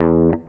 FUNKY, Grooves; USB Soundscan vol.31; Miro s Grooves; AKAI

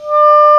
Oboe p D4; Digital Sound Factory Virtuoso

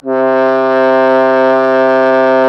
FRH, Horns; Sound & Vision - Gigapack I CD 2 (Roland); Brass&Woods1

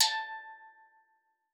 A4, STEELDRUMS; Xsample VOL-4

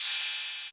CY 808, TR808

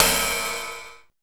HARSH CRASH, CYMBS; Zero-G; Funky Elements

